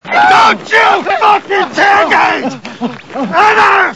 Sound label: tailgate